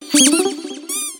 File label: plush toy lootbox sfx; discord